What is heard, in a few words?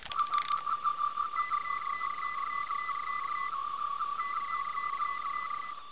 Tricorder